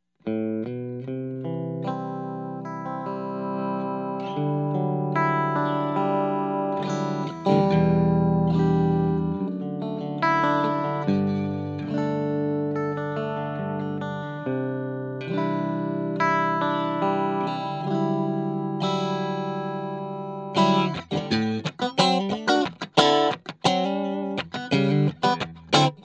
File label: clean